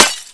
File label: glass, debris